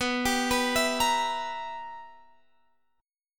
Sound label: B Major 7th Suspended 4th Sharp 5th, chord